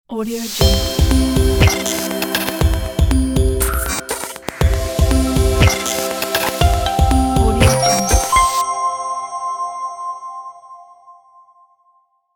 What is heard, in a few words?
technology logo